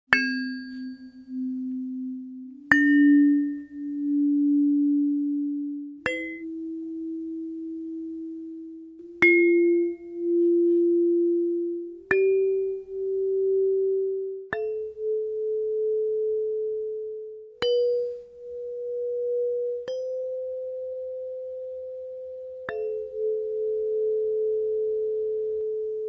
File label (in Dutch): stemvorken kristal, 7 chakra kristallen stemvorken